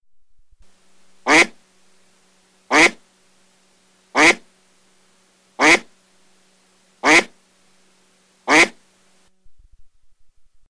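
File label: mallard; shum prirody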